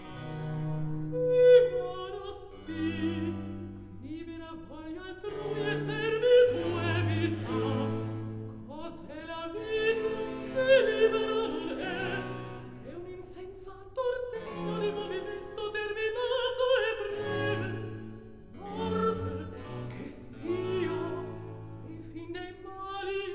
Recitativo; Act Three, Scene 4; Si mora, si; Floridante

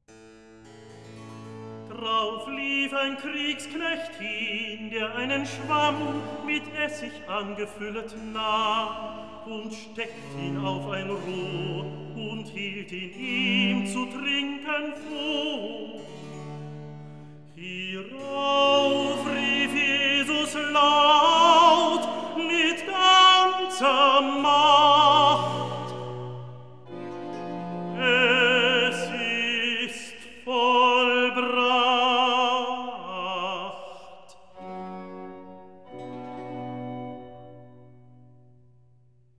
Recitativo; Drauf lief ein Kriegsknecht hin; Handel; Brockes Passion

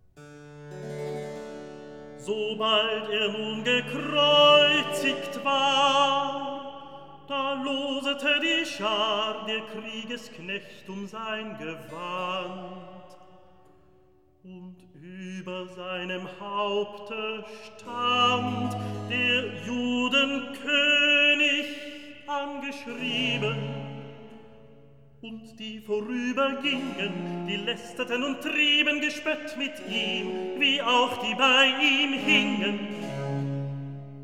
Recitativo; Sobald er nun gekreuzigt war; Handel; Brockes Passion

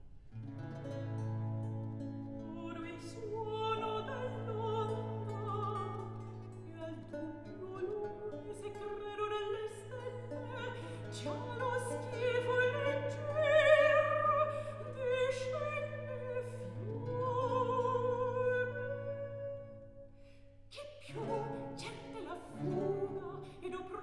Recit; Act II; Odo il suono; Handel; Ottone, re di Germania; Ottone, HWV 15

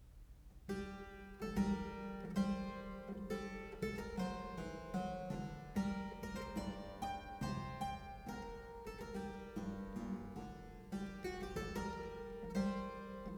Polonaise in G Minor; Bach; Anna Magdalena Bach Notebook (highlights); 尼古拉斯 · 麦克吉根

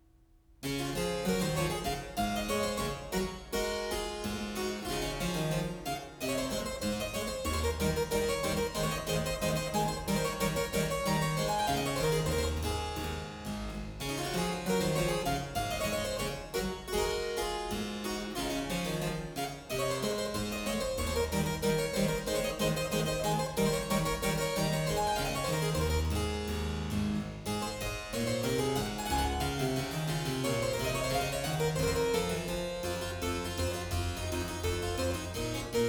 March in E-Flat Major; Bach; Anna Magdalena Bach Notebook (highlights); 尼古拉斯 · 麦克吉根

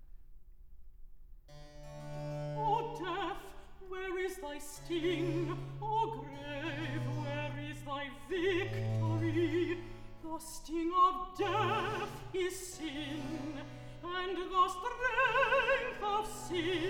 recitative; O Death, where is thy sting; alternate version; Handel; Messiah, HWV 56, Part II

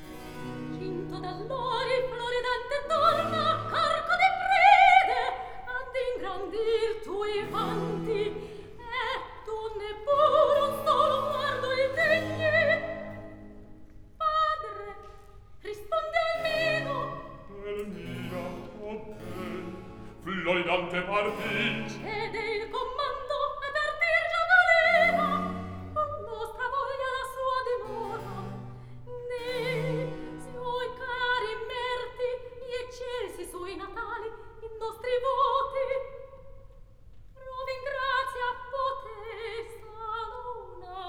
Recitativo; Act One, Scene 5; Clinto d'allori; Floridante

